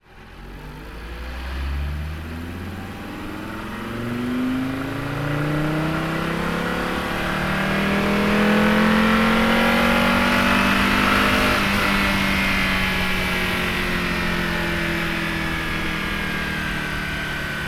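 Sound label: volvo 850 onboard fast drive stop blips engine right; Volvo 850 SE 2.5 1996; PZM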